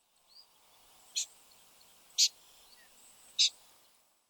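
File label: Pin-tailed Snipe; ishigaki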